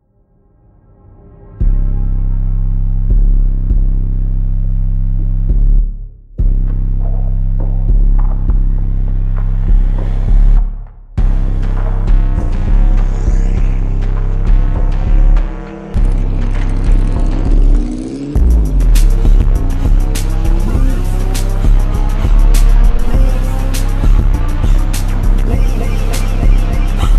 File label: slowed reverb